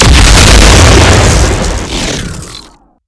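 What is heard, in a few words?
mine explosion; weapons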